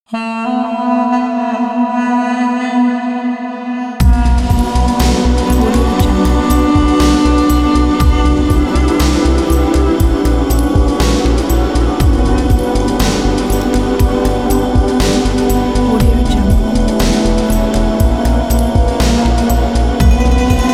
arabic pop

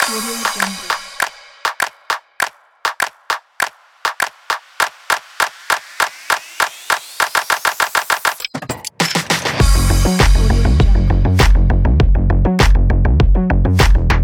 the techno

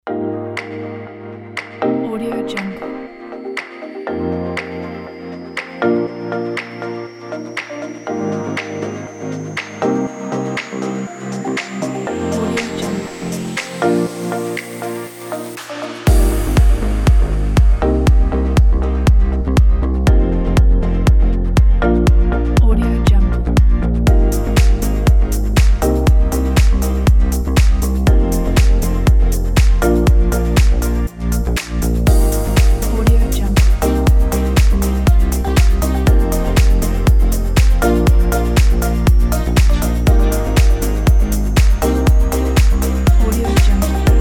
tropical dance

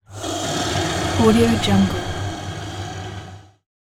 monster breath

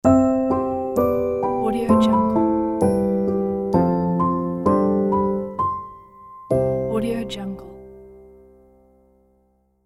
piano musical fairytale transition

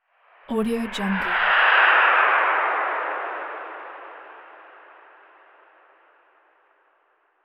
space pass by transition; hollywoodedge

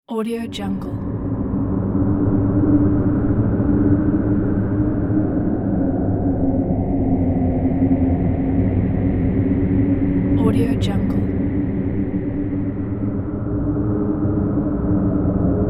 dark ambience

